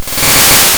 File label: repel use; P3D-Legacy